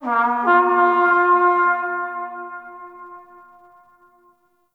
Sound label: Battle Trumpet Horn Call; Warframe; Tau